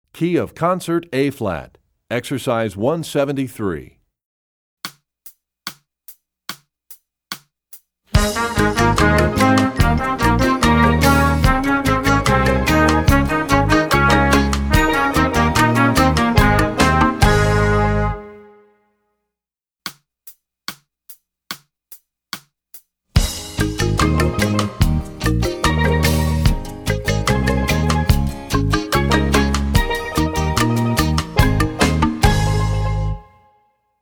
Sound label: Studies Concert Ab; EE2000 Book2; Rubank